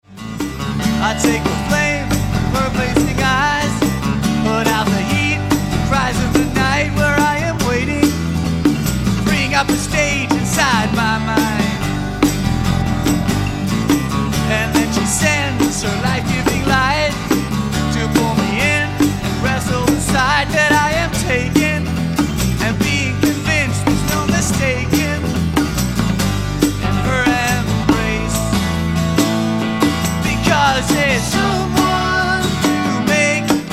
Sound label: Live Acoustic